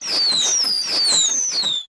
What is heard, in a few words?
Firework Launch; Buildalot2